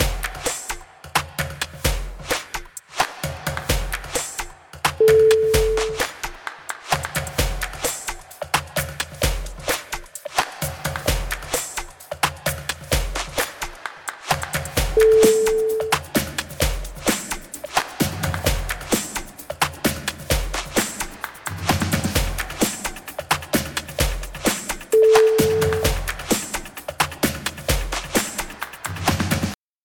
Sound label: percussion rhythm